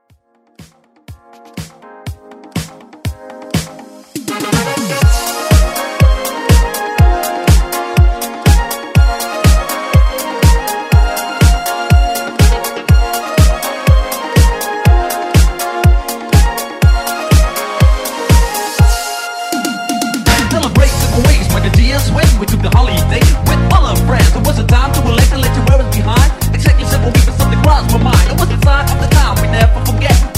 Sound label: Mash Up